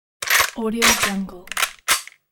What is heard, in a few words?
gun reload